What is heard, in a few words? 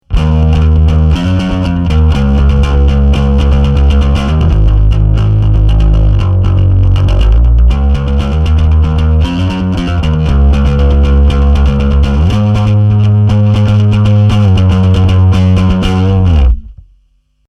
Rock